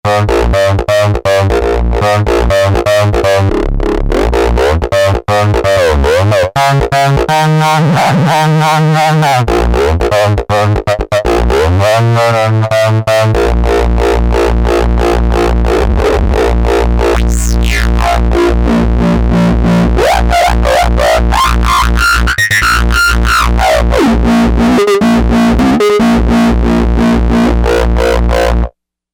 Cow Frog, synth

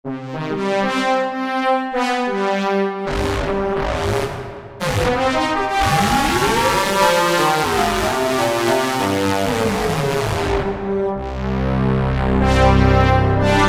P5 Polymod

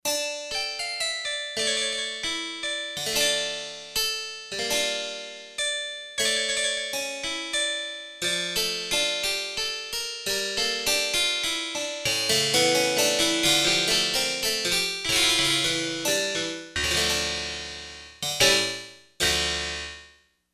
Clavicord, Korg MSC-4S Orchestra; Orchestra 1

